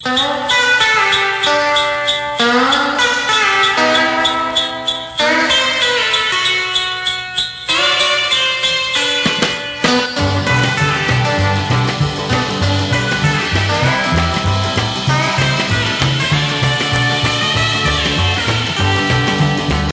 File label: Rockabilly Jingle Bells Ringtone